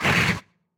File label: CRAWLER damage